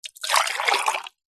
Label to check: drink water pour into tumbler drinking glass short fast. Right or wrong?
Right